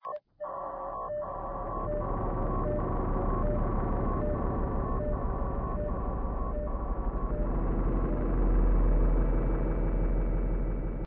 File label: ambience tech